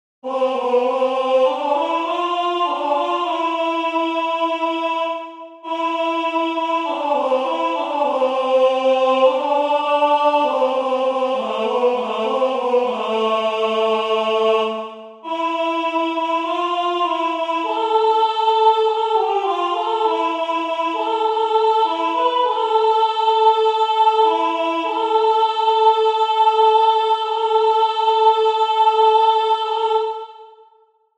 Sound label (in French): alto voix